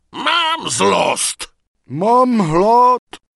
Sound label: ogre pissed